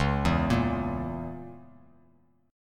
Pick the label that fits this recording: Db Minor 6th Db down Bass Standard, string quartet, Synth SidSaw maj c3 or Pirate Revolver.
Db Minor 6th Db down Bass Standard